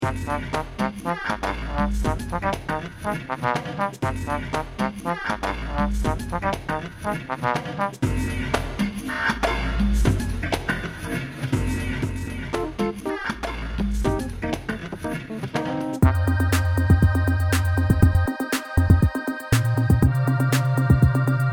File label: instrumental